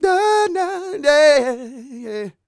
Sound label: SING, Vocal Planet R&B & Dance; ILIO